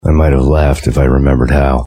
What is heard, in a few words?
laugh; max payne